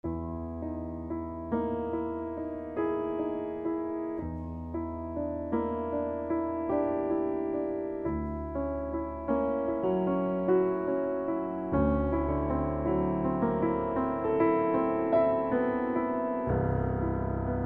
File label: flugel; albrechts